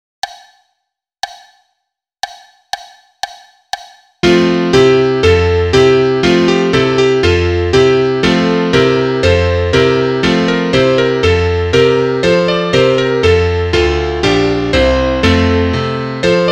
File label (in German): Klavier Solo, trompete; Aus Frankreich